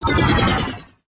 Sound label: player hit; Mr. Bean - Backyard Junk